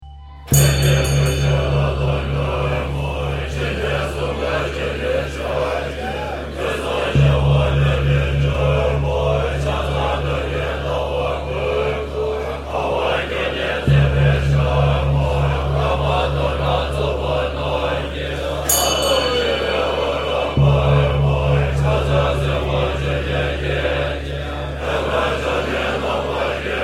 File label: evening prayer